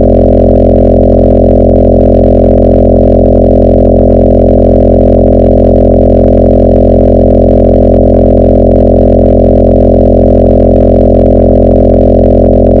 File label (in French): bourdonnement fm